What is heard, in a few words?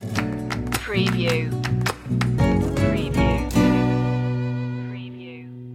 corazon de chacarera stinger